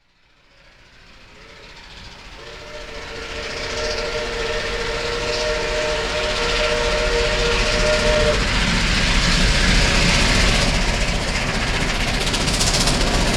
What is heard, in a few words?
Train Approaching